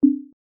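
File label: dialog error, Ubuntu System Sounds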